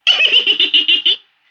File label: vox laugh small